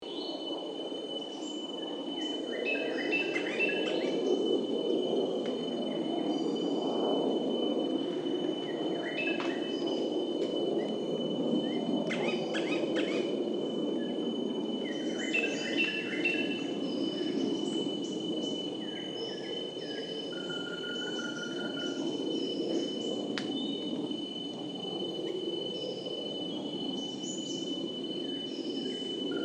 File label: Banded Woodpeckers; Macritchie